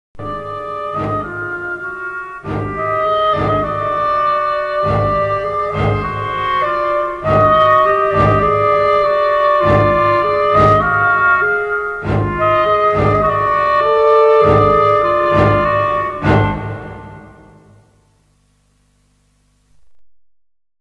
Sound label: jingle; foxcrime